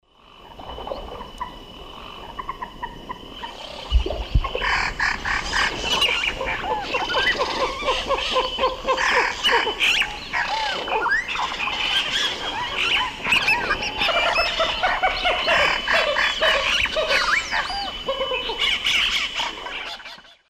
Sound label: Gallinula chloropus, calls; S. America; Eastern Lowlands